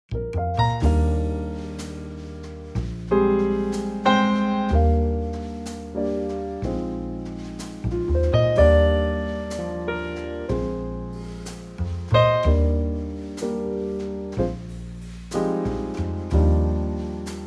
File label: Trio Eb